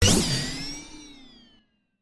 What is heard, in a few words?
card game upgrade buff shield fantasy magic cartoon positive buff boost